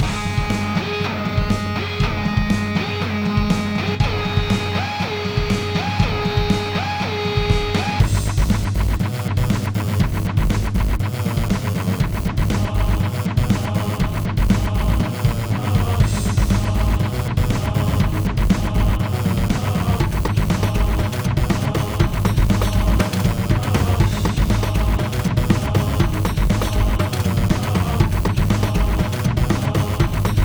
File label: enemy alert